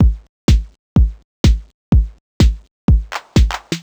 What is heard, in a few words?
drum 125, Classic Chicago House, Drum Loops